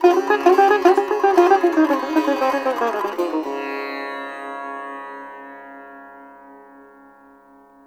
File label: SITAR; Spectrasonics; Heart of Asia